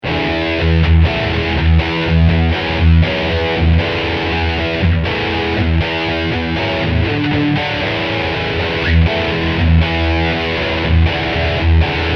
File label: Prog Metal Riff